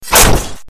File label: bloodied sword